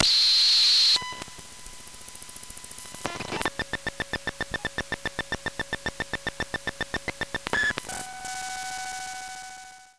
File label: Zoom H1 booting; EM Pickup